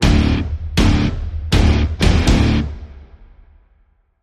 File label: STAB Guitar Phrase (D)